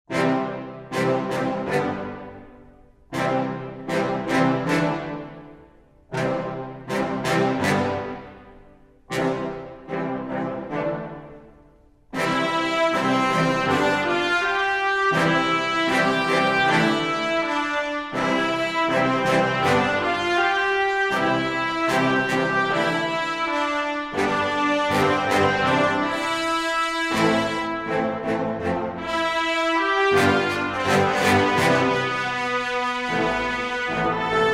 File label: Instrumental